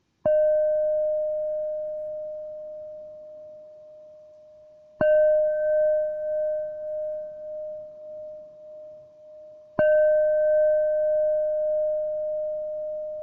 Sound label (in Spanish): 863g re, cuencos tibetanos; rojo